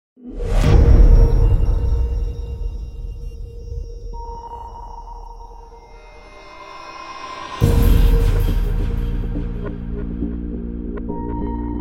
fx synth

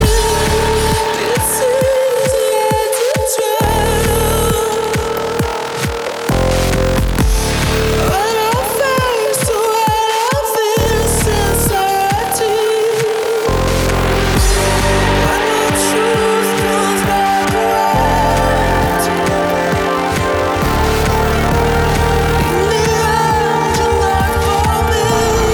instrumental mix vs acapella

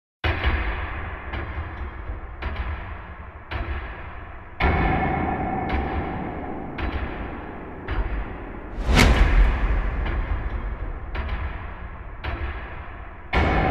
sound design

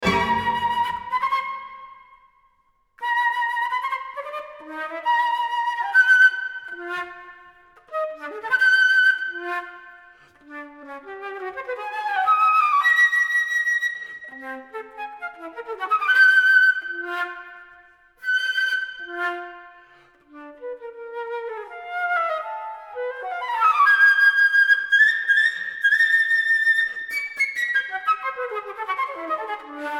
flute concerto poco meno mosso

